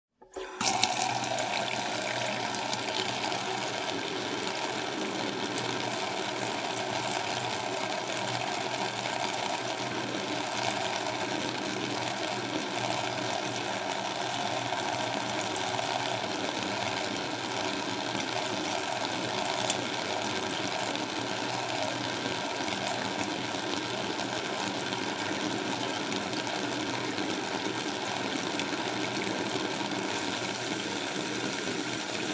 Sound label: Faucet Kitchen On Run Turn Off